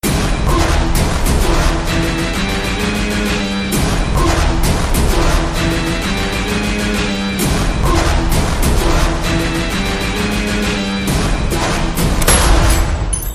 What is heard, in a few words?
ringtone theme; tamil; kadaram kondan